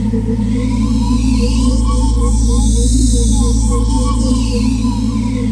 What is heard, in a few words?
tele hum; ambience; vendetta